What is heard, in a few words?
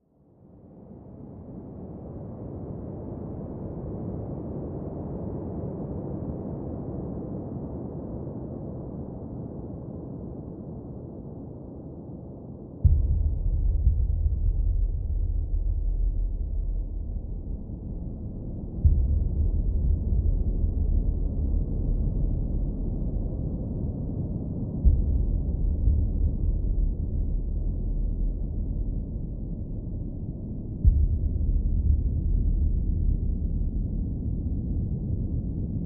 battle ambience; Star Sector 0.8a